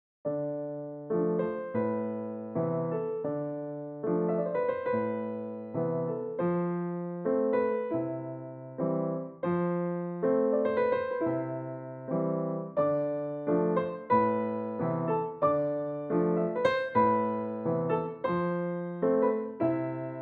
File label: Valse, Bos Vienna Imperial, piano